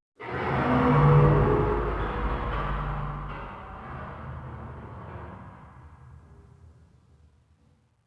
metal groans

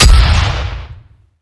rocket launcher fire

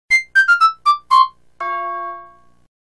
tank fail

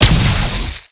plasma shotgun fire

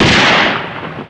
shoot, weapons; the steel battalion

